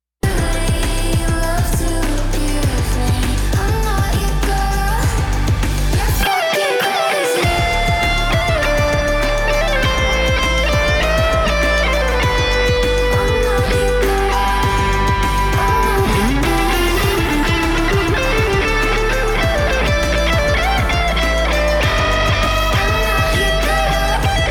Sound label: Solo; American Beauty